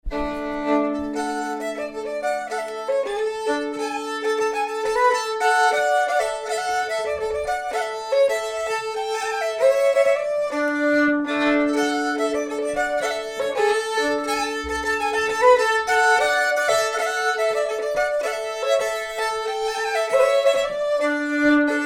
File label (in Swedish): Polska; e Erkgärds Mats